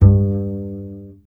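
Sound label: contrabass G; Miroslav Vitous; Solo Instruments VOL-2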